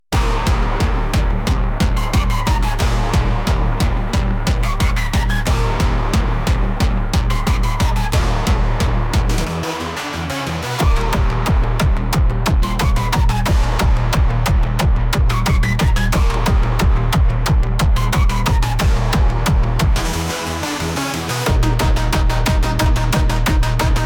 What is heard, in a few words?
Binary Groove; Frequency Obsession